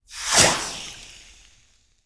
Steam Sizzle Hit

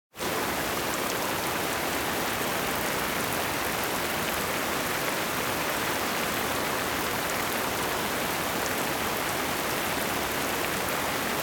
Rain Fall Medium Ambience - Larger Drops; Hollywood Edge; sound designer's toolkit v2

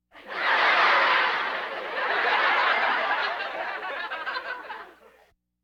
Laugh tracks; Valentino Production Sound Effects Library CD50

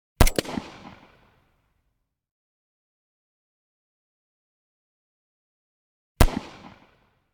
.45 ACP Blast Close; Hollywood Edge; Heavily Armed

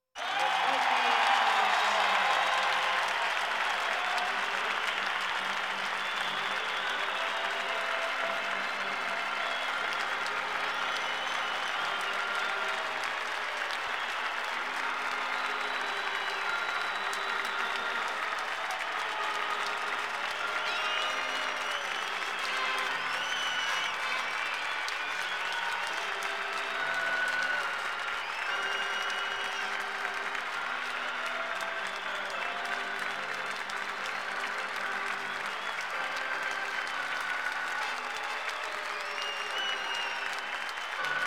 Sports crowds

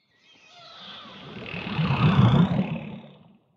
sea animals; Blind Drive

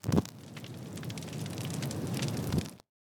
Fire Spell Ready; AudioWizards

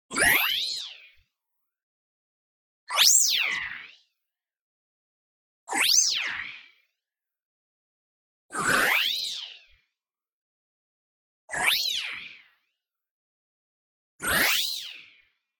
tonal, resonance whiz; boom library; Anime Essentials; DSGNMisc

